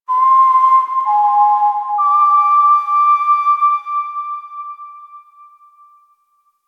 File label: Whistle; Warframe; The New War; Zariman